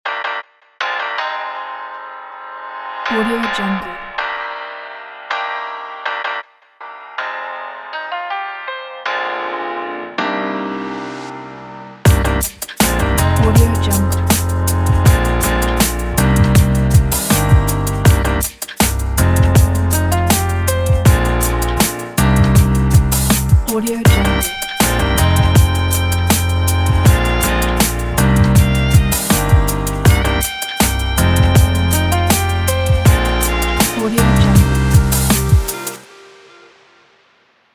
pop soul

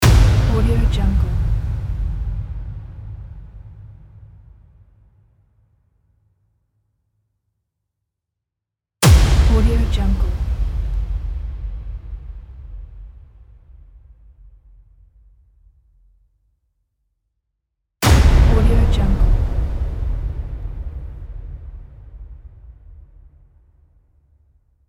trailer hits

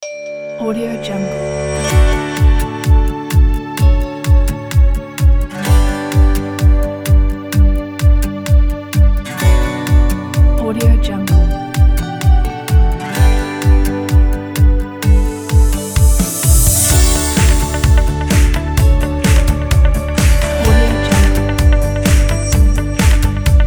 motivational pop happy pack

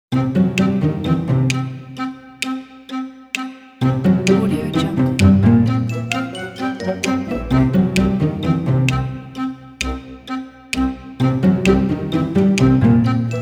pizzicato comedy kids; music2noise

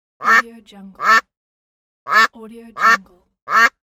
duck quack

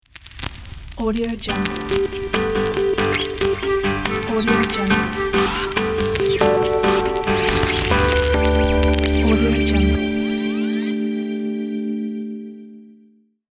bossa nova retro logo

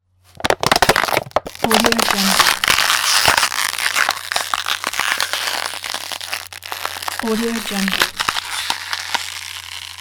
plastic bottle

